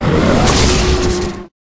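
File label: Dragon Skeleton bot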